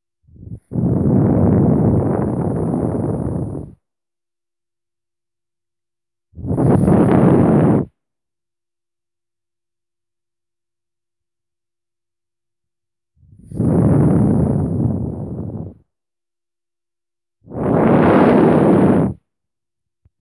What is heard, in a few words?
vocal